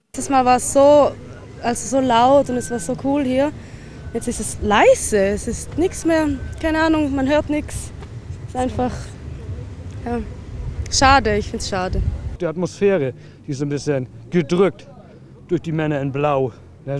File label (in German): collage gti fans